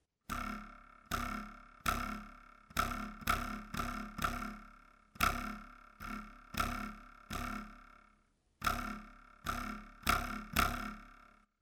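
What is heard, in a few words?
ruler sound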